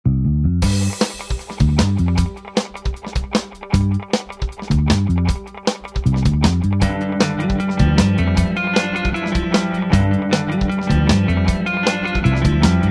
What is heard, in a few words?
Corporate Rock